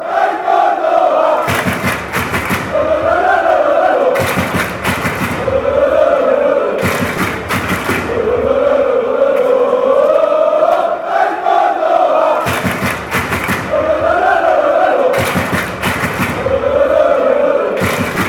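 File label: fanchants, football chants; el cordoba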